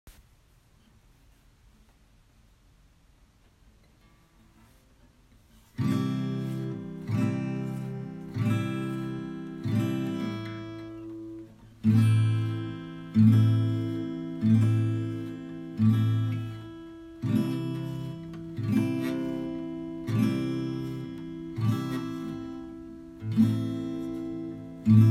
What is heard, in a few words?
Chords practice